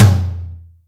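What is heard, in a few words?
Room Floor, Acoustic Tom, Yamaha DTXpress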